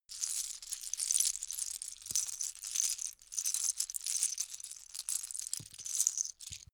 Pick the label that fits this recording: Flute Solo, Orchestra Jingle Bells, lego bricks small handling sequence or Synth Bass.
lego bricks small handling sequence